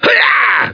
SW YELL

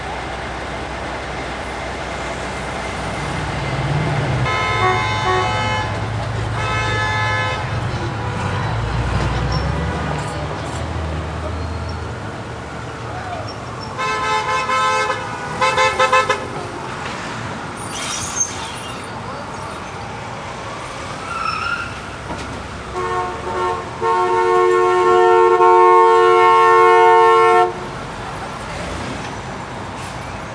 loop main; Spider-Man CD Cardz Mary Jane Watson

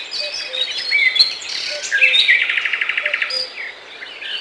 tram; NFS High Stakes

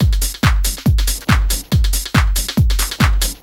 movie, Techno Drums; TechnoMusicMaker

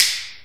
FNGR SNAP; Groove

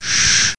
Pchit; MOBICLIC 13

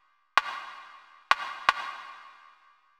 PERCUSSN, Ambient, Drum

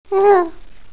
cooing, human babies; Kaboom! Junior

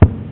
Move; StarBound

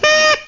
toot; Take2 v1.7

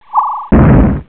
Cannon; Galactic Trek 1.6.1

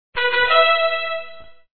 trumpet; Together in Jesus - First Reconciliation - Computer Fun; word snatcher

